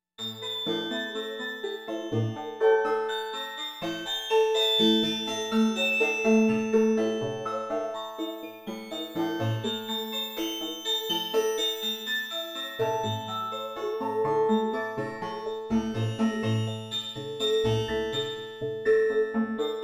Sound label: rand walk 5 combs, synth; analogsynth